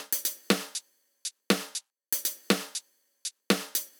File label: Beat Perc Mix, 120bpm, Old Skool House; Construction Kits; Big Fish Audio; OSH House of Amnesia